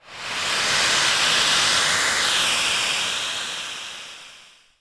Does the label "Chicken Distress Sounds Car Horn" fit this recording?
No